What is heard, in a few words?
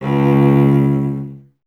STR cello C#, Cello; Miroslav Vitous; Solo Instruments VOL-1